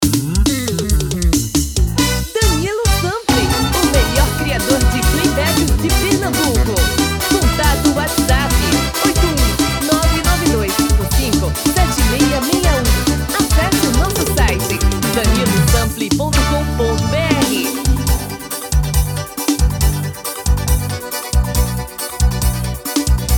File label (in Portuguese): FORROZAO; um tom abaixo